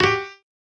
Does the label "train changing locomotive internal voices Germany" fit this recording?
No